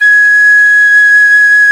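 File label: PICCOLO; Roland LA; ORCHESTRA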